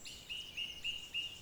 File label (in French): 5x Cuic, foret oiseaux bois frappé; stereo